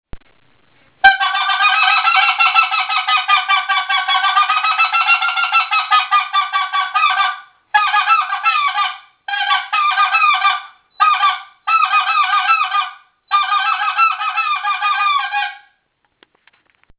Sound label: Speck Call